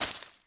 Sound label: hand grab ivy